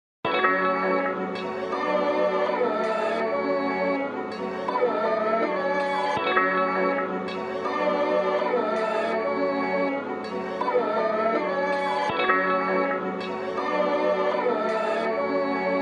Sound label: Instrumental